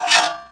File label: Open